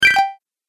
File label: Bonus Collect, video game sound effects